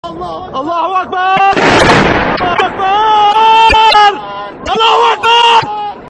airstrike; hl2mp

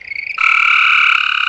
agrub stimulated, antlion grub; npc; hl2mp

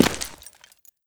gear, combine soldier; npc; hl2mp

